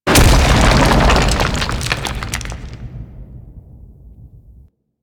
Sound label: Moon Bolder Impact; Ambience; Warframe; Orokin Moon